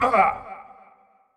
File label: Marv Hurt